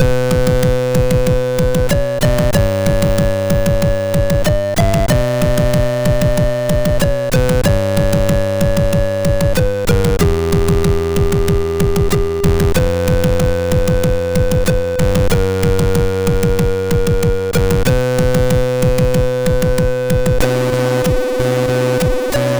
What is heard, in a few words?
sid MOS6581R2; Dark Times